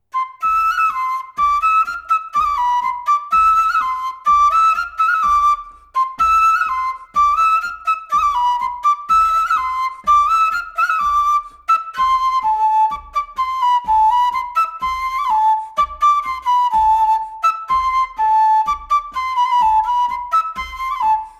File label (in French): Bourree